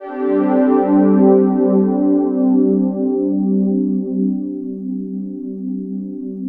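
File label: soundtrack, ambient atmospheres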